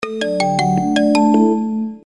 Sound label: Cartoon; ZTE Z222-AT&T